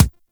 Weapon Punch Pistol; Warframe; Weapons; Pistols

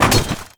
Generic Ledge Grab; Warframe; Characters

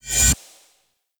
Choir Eyes End

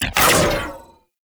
Arch Gun Alt Shot; Weapons; Warframe; Archwing; Hildryn

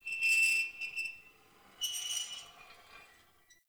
Metal Screech Small; Props; Warframe; Tau; Ambience

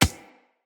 Beach Ball Bounce Dirt; WaterFight; Beach Ball Floof

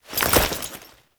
Foley Weapon Swap; Warframe; Characters; Kahl

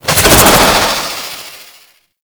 Manic Dodge Movement, Foley; Warframe; Grineer

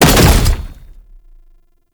Wall Climb Impact; Warframe; Oraxia

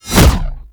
Bayonet Melee Combo; Warframe; Tenno

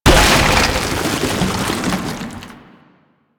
Rotpod Destroy; Warframe; NineteenNinetyNine; Stage10TheZoo

